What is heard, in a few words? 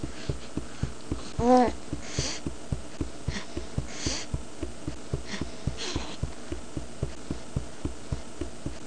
Sound Feet Running